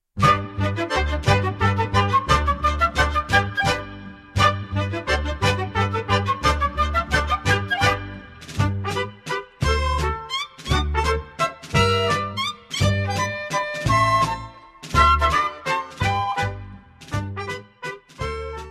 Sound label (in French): Varsovienne -